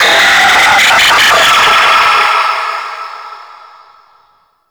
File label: Space Drone Attack; Warframe; Grineer